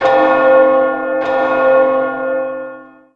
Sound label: belltower